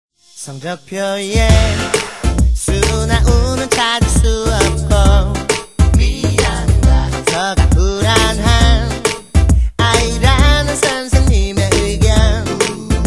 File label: learn piano